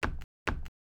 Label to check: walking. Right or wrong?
Right